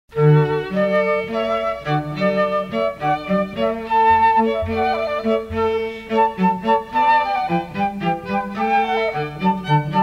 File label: Hornpipe, chamber music